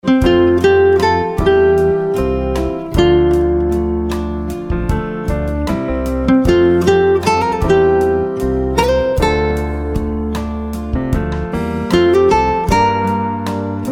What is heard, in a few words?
Blues